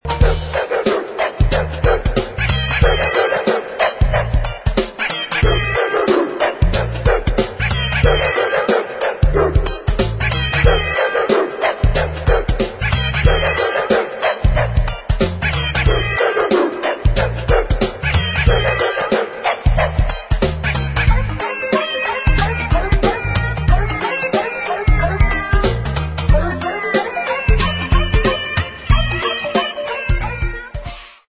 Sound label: Christmas Dog Cat, Sagem Melody; MY-V-75-CD